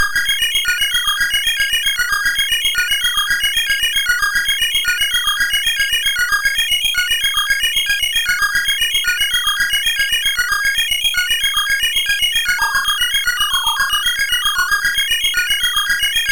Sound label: sid MOS6581R4; Ninja Remix